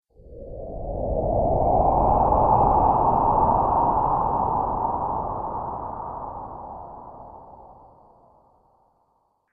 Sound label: Astral Winds, Science Fiction Sound Effects